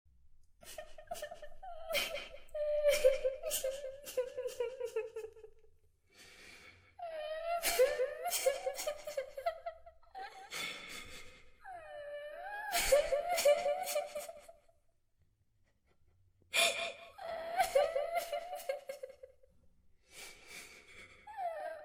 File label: creepy little girl crying and weeping sound effect, horror